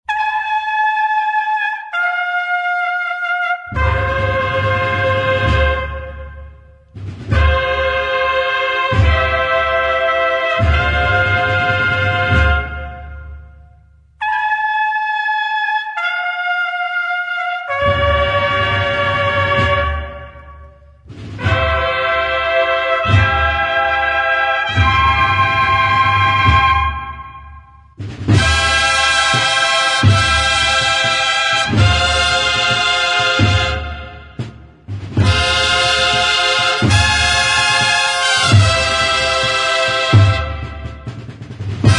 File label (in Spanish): marcha de la crucifixion; semana santa